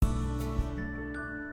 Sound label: wand Trans